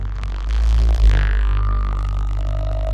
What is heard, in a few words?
glitch error; sound effects